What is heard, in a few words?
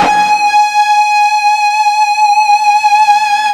FUZZ G#4, Guitar; East West; Steve Stevens Guitar Samples Collection